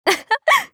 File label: laugh; Eternal Return; Hisui; JPN